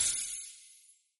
freeze beam; Gotham City Speed

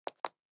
jump in; dorothy; Dorothy And The Wizard Of Oz - Cookie Magic

